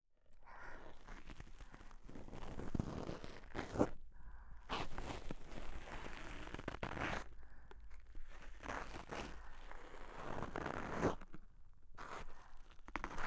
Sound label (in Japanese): 左耳 吐息 綿棒耳かき ループ音源; 和み処 一番星～距離感の近い甘えん坊な仲居『月さん』が一生懸命ご奉仕する癒しの 一日～; 月の吐息&寝息 ループ音源